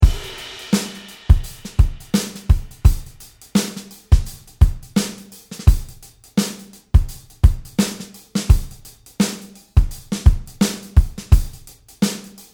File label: shuffre, guitar